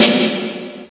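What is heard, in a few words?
orchestral snare [E2]; Star Trek JR Audio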